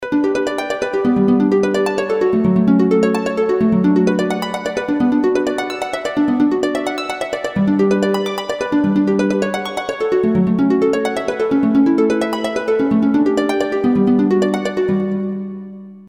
harp, Roland JV2080

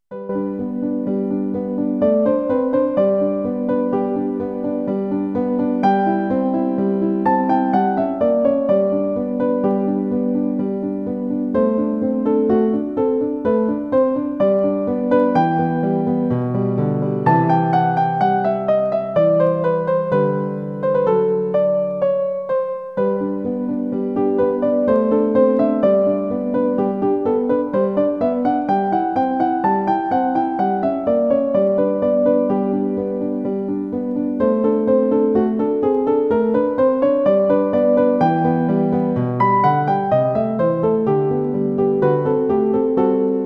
piano, Roland JV2080

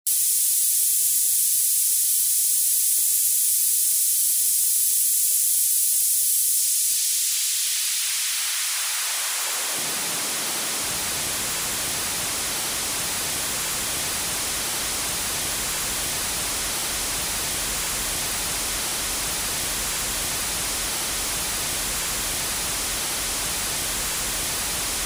xp highpass, Roland JV880

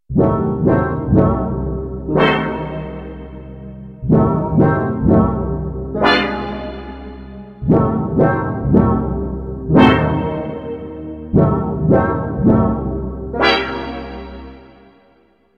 korg m1r synth; synth: suspence; cpu NEC-V50 (part of 70216 family) manages 16 voices polyphony

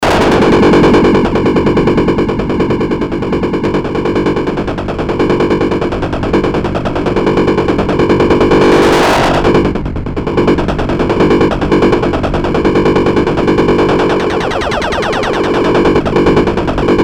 synt 8bt, Moog Minitaur

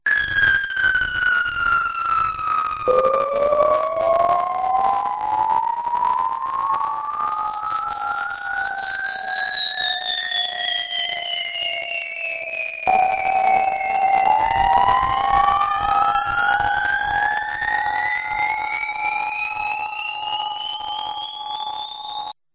fx burning, yamaha tx81z

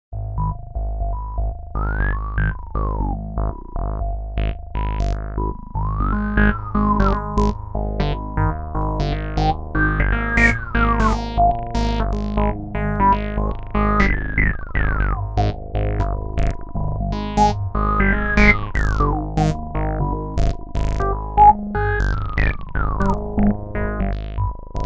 Synth: tb303 complex, Emu Xtreme Lead-1

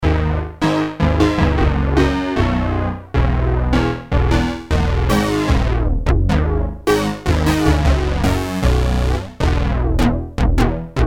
bass, redsound darkstar; Detuned synched

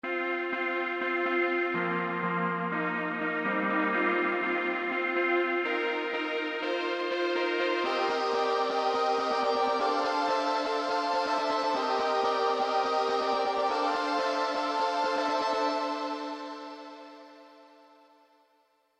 synth vintage, yamaha sy55

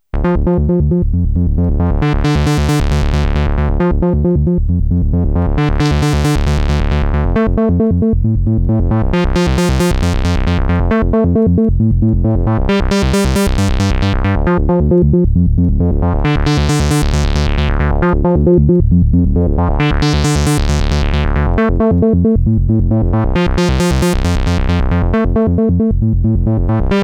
synth edm; seq EDM; Polivoks formanta

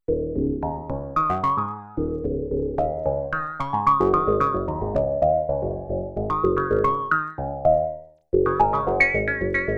synth rezo, Roland alpha juno

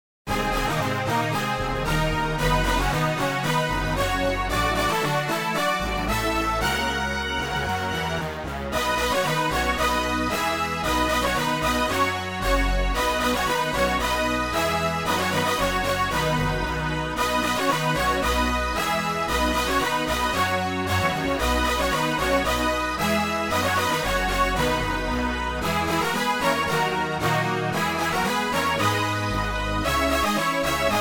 brass fanfare, Roland JV880

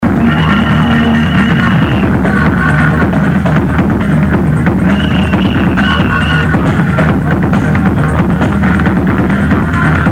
Roland JS30; viking

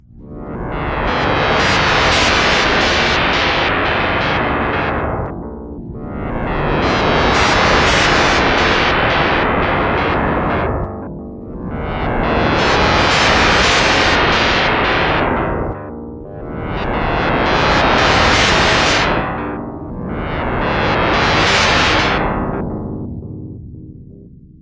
fx chaos, yamaha tx81z

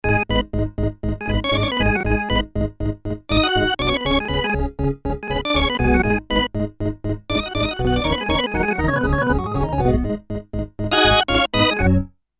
org farfisa, emu carnaval

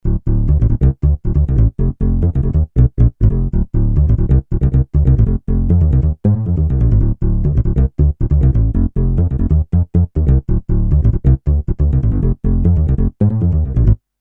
bass ac, Emu Carnaval; acoustic bass; Motorola 68000 CPU (mc68hc00fn10) system handles up to 32 voices polyphony

